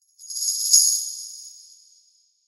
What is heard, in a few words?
Low Tambo